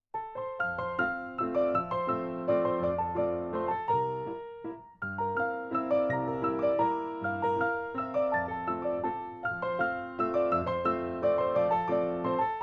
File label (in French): Valse; Marie Jael